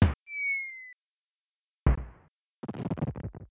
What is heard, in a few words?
firework; smw